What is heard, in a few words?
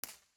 IR Siracusa